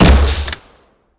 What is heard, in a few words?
Crossbow Shot